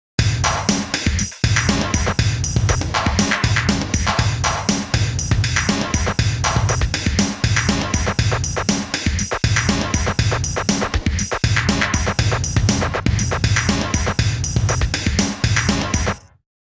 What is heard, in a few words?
mad 120bpm